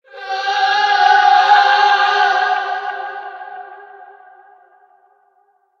ghost scream

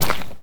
mud footstep

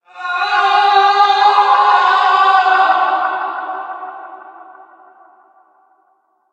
ghost scream

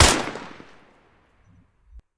pkp shoot, weapon

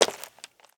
stone footstep

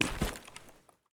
gravel footstep

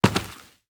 dirt footstep

